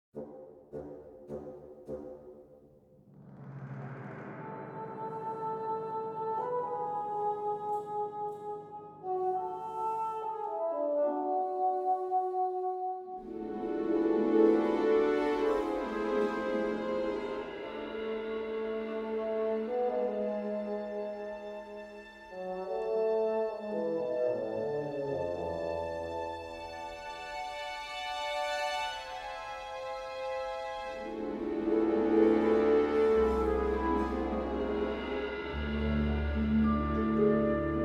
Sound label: World Music